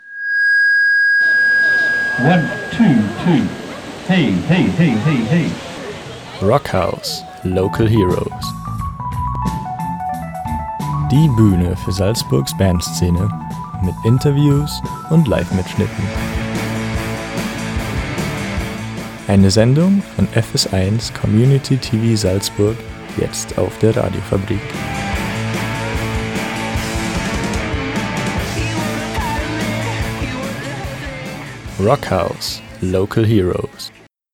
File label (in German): Sendungsjingle; TVonRadio; Local Heroes